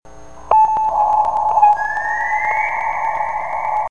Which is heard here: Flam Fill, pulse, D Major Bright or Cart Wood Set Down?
pulse